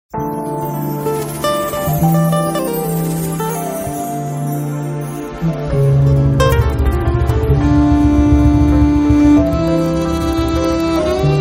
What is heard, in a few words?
FLAMENCO BIKUTSI